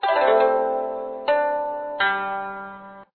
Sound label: instrument koto